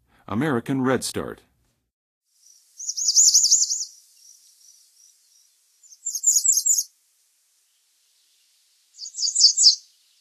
American Redstart, Songs of Birds of North America; Western USA; Western USA Songbirds